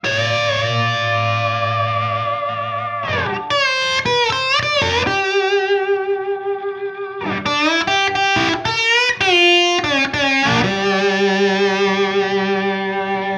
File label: Bed Slide 4824